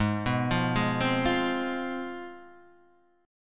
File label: C Major 7th Suspended 4th Sharp 5th, Ab, chord